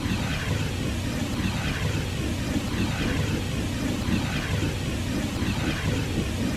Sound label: sound Marsh Jetboard Loop